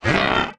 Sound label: Jump Attack; Sett; Playable Tutorial Level